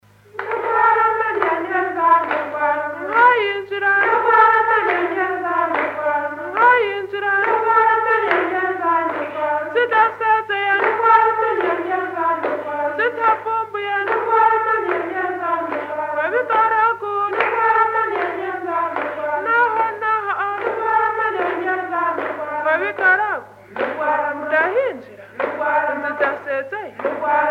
uruvyino bruiloft, burundi

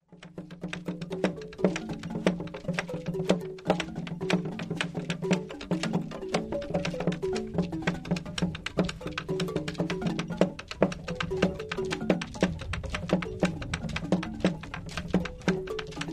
gyil, ghana lobi

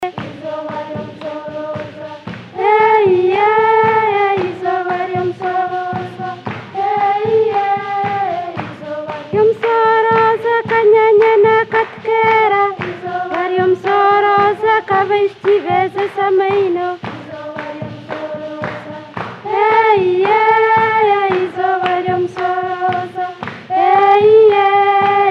Rugaaniire, uganda

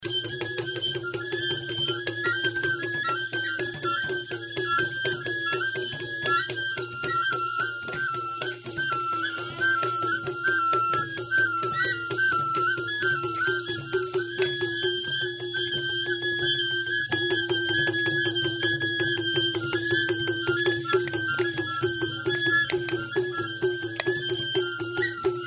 gungonga, ghana kassena

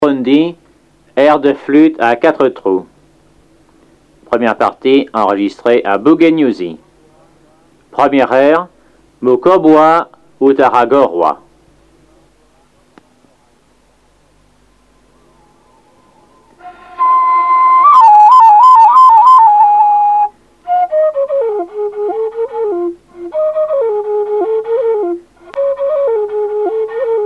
entertainment, Burundi; Kitega

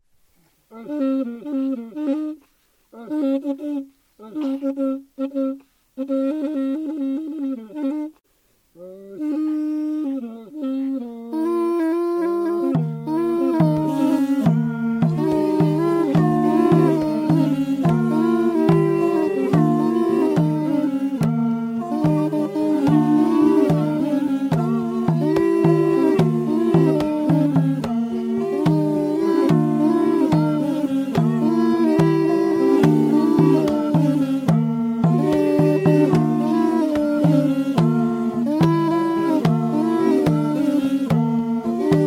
amakondera, rwanda

